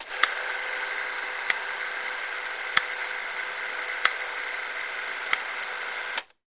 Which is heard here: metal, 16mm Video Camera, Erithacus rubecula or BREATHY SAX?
16mm Video Camera